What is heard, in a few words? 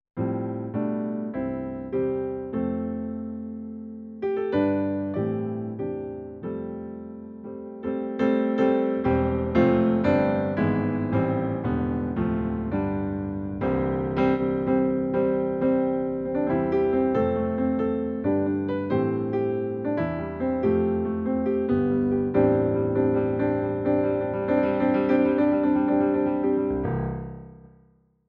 brilliance